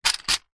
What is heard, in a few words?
leisure game plastic tray with discs in set down on carpet floor; connect 4